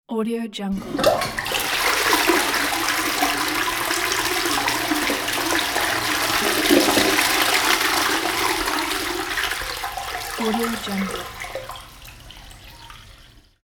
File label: toilet flush